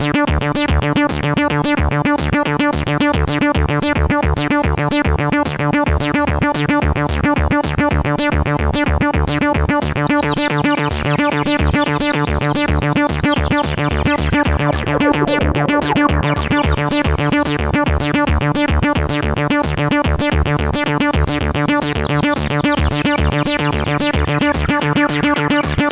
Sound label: sweep loop, synth; Monstersynth 3; Future Music